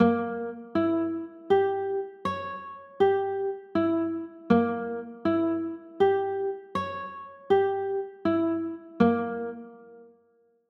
c sharp dim7

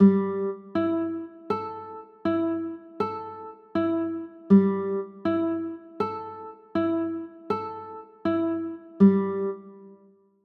a 6, chords

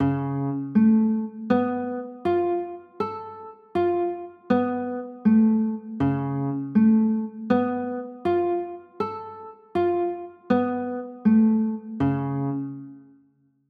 d flat 7 sharp 5, chords

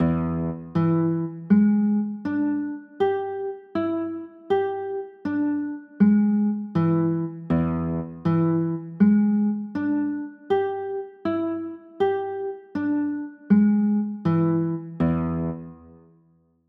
e 7 sharp 9, chords

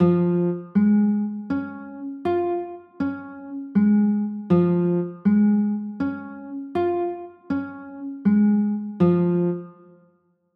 d flat maj, chords

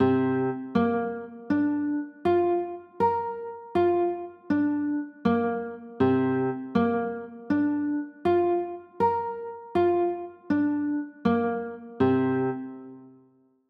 c 6, chords; c 11

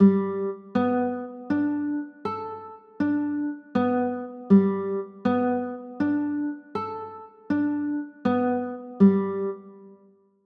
g add9, chords

